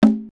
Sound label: LM-1 BONGO, LinnLM1, perc; drum machine